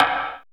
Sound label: pallet perc; Drums In Your FACE!